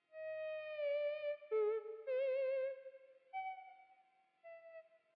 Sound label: Theremin; Hylics